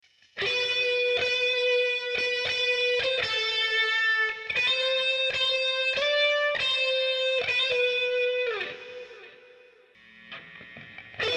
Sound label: driver vs driver